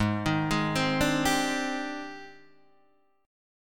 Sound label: G sharp Major Sharp 11th, Guitar Standard; 4,6,6,5,3,3; arpeggiated; capo=3